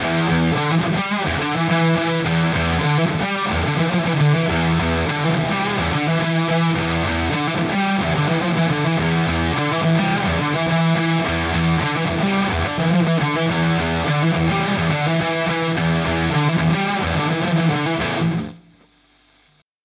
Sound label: Harley Benton Fuzz